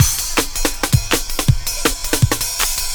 The Break 162, breaks; minihalfs

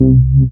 SYN SH, Roland; Sound & Vision - Gigapack I CD 2

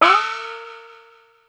China Small Gong, Yamaha DD-35

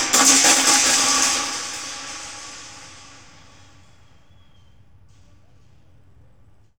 thrash metal; SFX

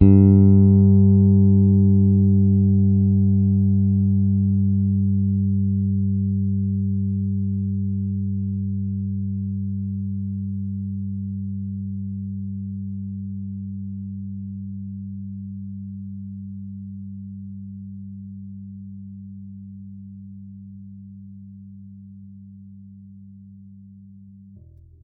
G3 soft, Pedulla Thunderbass, Bass; Giga Samples Collection

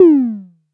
vermona drm1 mk3 tom

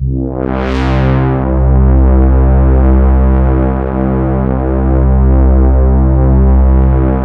MONO PAD; PADS

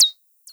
Percs, Elektron MachineDrum; SPS1 MKII

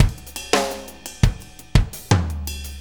Drum Tools, Loops; No Small Feat; Sonic Foundry (Sony Creative Software); Various Loops

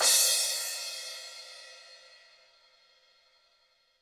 HiHat & Crash, Roland TD-20; HiHats

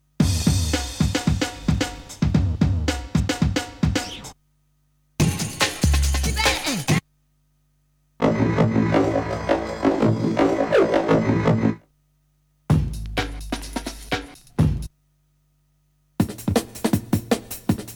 Breakbeats; Kniteforce; 1000 Samples Volume 1

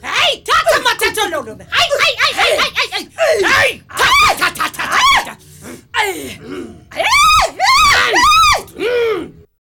WILD GIRLS, AFRO NOISE, World Voices; ILIO; Vocal Planet VOL-5

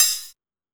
Techno D; EMU XL-7

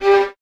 Violins G 3; Miroslav Vitous; String Ensembles; Detache

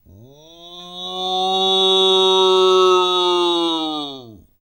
TUVAN COW; Spectrasonics Vocal Planet; Jazz FX

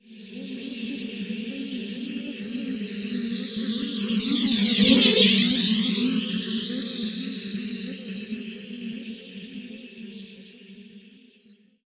FLIGHT; AKAI Anime Sound FX; FLYOBJECTS

